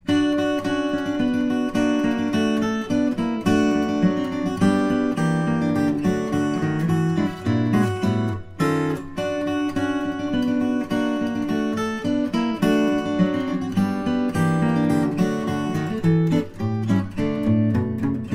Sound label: jingle bell guitar